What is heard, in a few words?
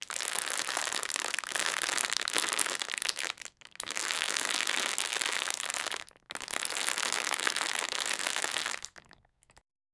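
empty plastic crunch crack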